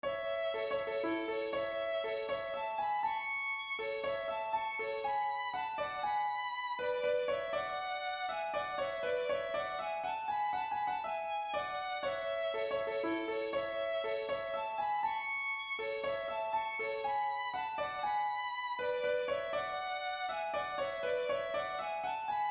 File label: waltz clog, 3rds, 120bpm, fiddle